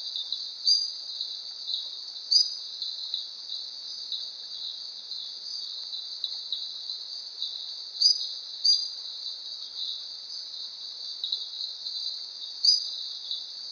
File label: Centrolenidae Hyalinobatrachium fleischmanni